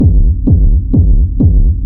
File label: sub kick techno tube; drum loops